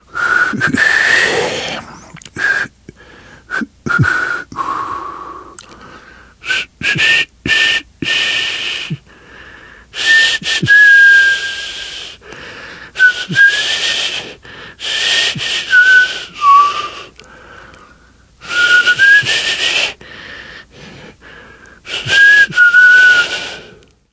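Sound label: nervous whistle; Left 4 Dead 2; Source Engine; video games; namvet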